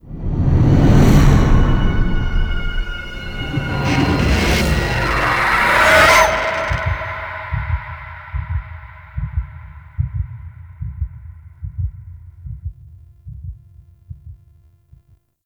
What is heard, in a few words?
Level Load Stinger; Warframe; Shadowgrapher